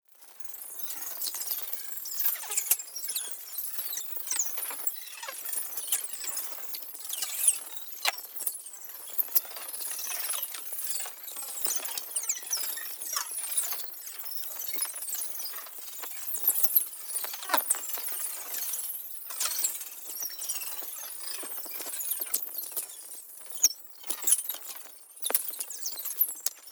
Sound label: NEON PARTICLES SMALL FAST; Light Energy Flux; boom library